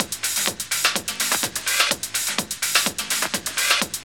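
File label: drum loop; X-Static Goldmine 3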